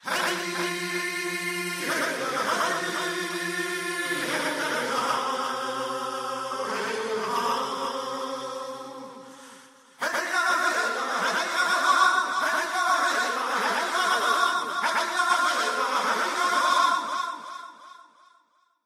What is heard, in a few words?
canyon echoes wet